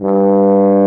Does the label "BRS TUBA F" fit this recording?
Yes